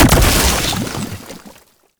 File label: Whip Proc Explosion; melee; weapons; Oraxia